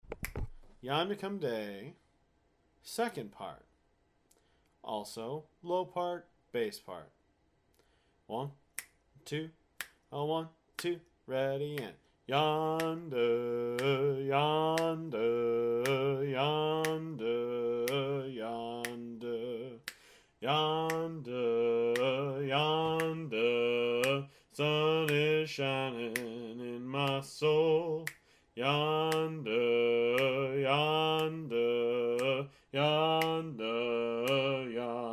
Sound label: Bass, choir